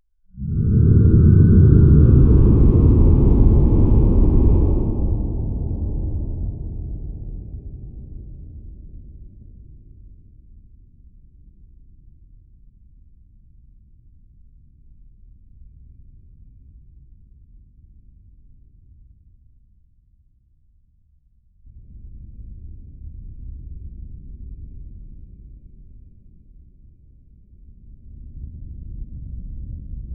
stomach rumbles; gogetas stomach rumbles; power

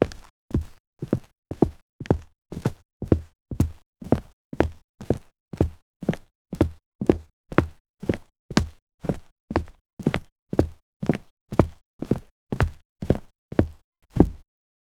steps with boots on concr, footsteps

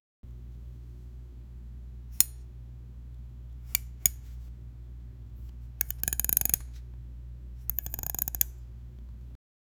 knife handle grip sound

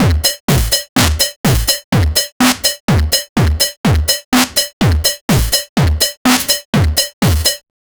amapiano drum beat

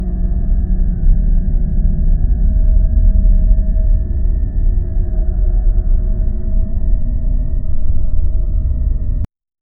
post apocalyptic

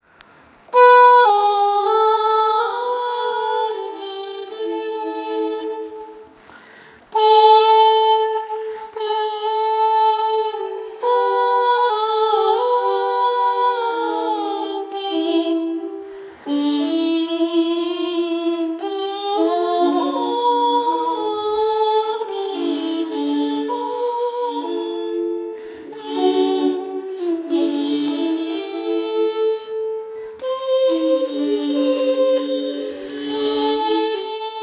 beautiful female voice wi

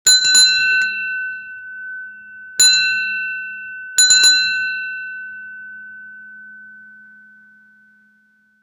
eerie bell ring from earl, ship

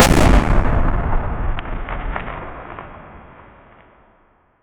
exploding concrete wall; destruction

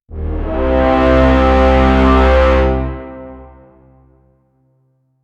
epic battle horn sound

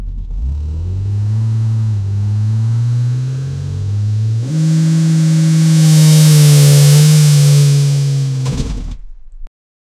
powerful american muscle, muscle car

